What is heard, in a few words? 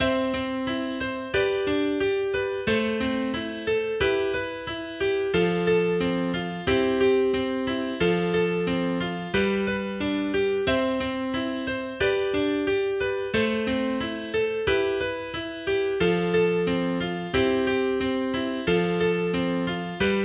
Canon in D Solo; Pachelbel; Org plus Acc